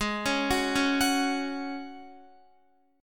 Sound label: G sharp 7th Suspended 4th G sharp, Guitar; G#7sus4; arpeggiated